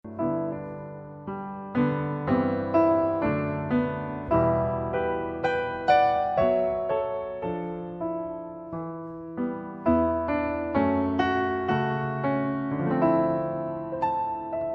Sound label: Tone Poem for Piano